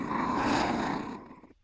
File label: mobs dirt monster; server-nalc